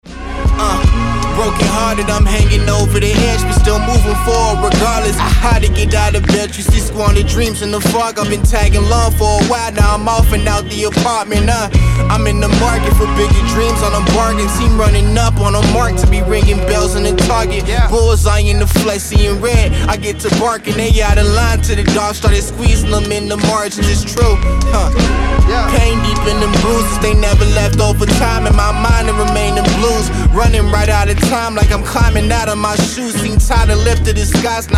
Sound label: HIP HOP